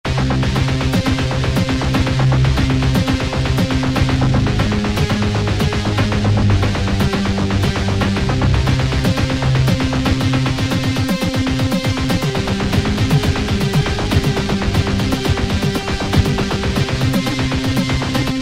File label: Se Down Spiral, Yamaha EX5R; Factory presets